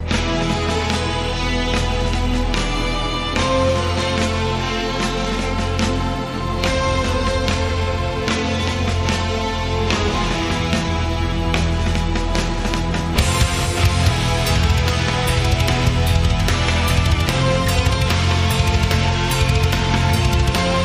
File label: Oriental Dawn